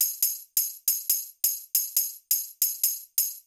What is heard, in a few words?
SLAYBELL, Techno 138 BPM; Best Service ProSamples vol.54; AKAI